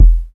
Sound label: Kick, Afrobeats Drum Kit; Drum One Shots; Cymatics; PARADOX II